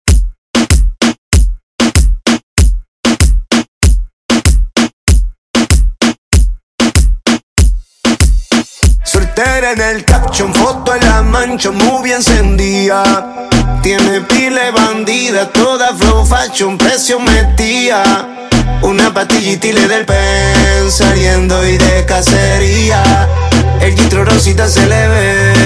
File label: Intro 96 BPM